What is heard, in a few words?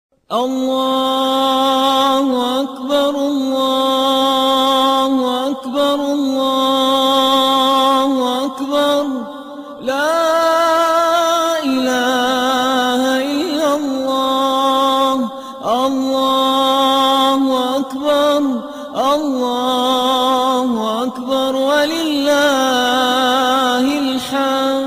Allahu akbar Muslim Song Ringtone